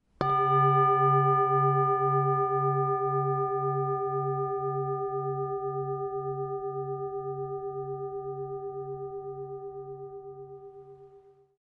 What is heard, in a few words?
Bell; innerU Mindfulness Ocean Adventure